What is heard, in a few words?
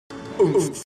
oomf; cave